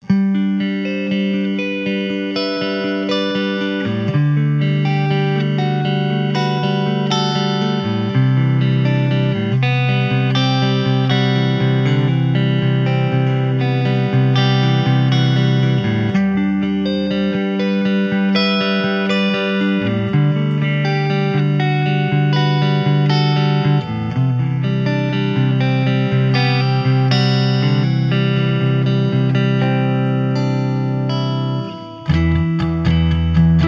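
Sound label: clean, guitar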